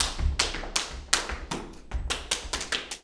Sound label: Flamenco, ZAPATEADOSOL